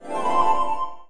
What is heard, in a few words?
hint sparkle; Nickelodeon - Back to School Search